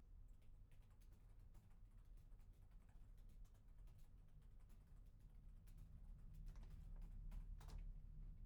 keyboard; IEEE AASP

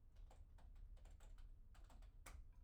keyboard; IEEE AASP

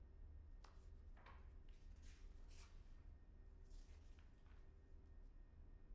pageturn; IEEE AASP

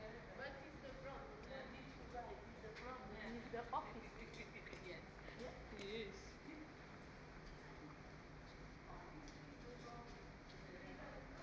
tube station; IEEE AASP; scenes

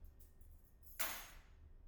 keys; IEEE AASP

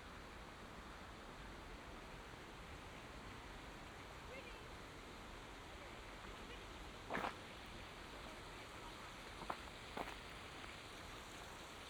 park, scenes; IEEE AASP

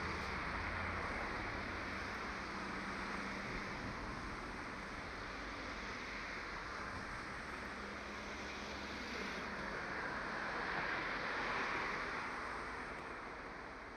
busy street; IEEE AASP; scenes

